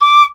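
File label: piccolo staccato D5; Flutes; DirectWave; Varazdin Orchestral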